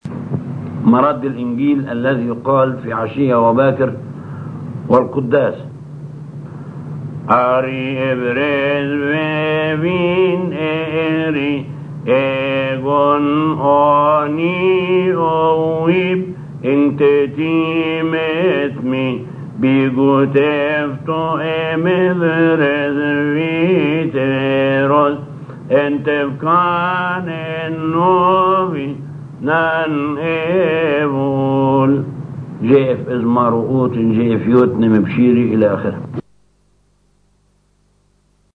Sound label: Gospel Response; Alhan Maleyka; 24 Priests